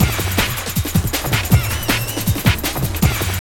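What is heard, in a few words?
drum loop; X-Static Goldmine 3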